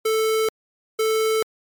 pii-pii

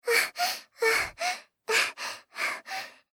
Pant

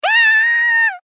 Polite Damage Ahhhh; Rei Yumesaki

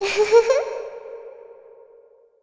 Laugh Ghost Reverb

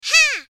haaaa

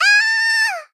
Moe Damage Ahhhh

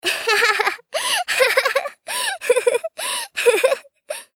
Laugh Long

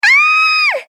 Scream "Kyaaa"

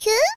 Cry "Kyuu"

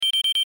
System Gauge

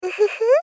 Gentle Smile "Uhuhu"; Rei Yumesaki

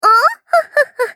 Laugh Ohohohoho

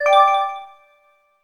System Accent

